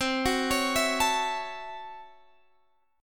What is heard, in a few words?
A 6th C, Chord; A6/C arpeggiated